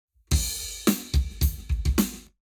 Lab Wet Drums 0deg

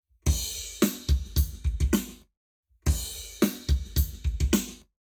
Lab Wet Drums 0to360deg; eBreve

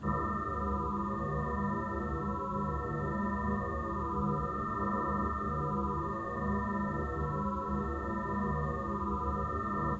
2x Multi-Tone AVAS, electric vehicle

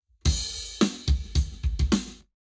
Lab Wet Drums 0deg

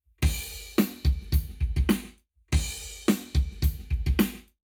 Lab Wet, Drums 0 to 360 deg; eMagLS

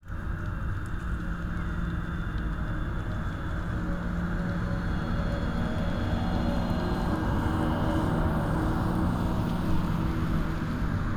pass By forwards, electric vehicles; id3

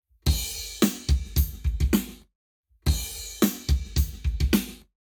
Lab Wet Drums 0 to 360deg

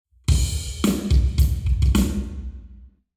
Hall Drums 0 deg

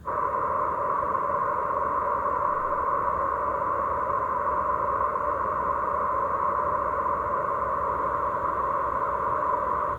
3x Noise AVAS, electric vehicle

